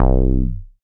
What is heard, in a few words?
SYN TB SUB, Roland TB-303